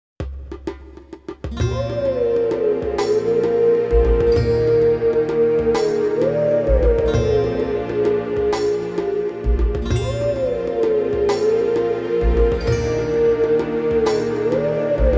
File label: Howling Duet; Legend of Zelda: Twilight Princess HD